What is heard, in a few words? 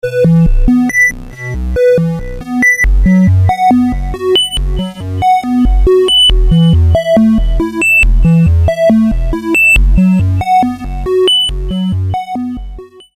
dual TH4046 VCO, modular